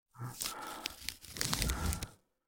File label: bandaging; out of reach treasure royale 2020 gamerip pc